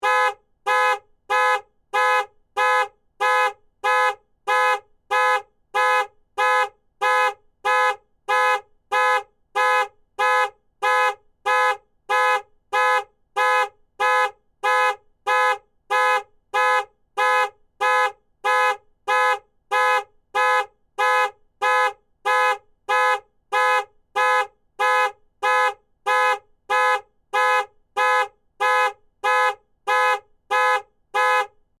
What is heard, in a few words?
Car Alarm Horn, Transportation, Automobile, Car, Alarm, Horn, Loop; Cars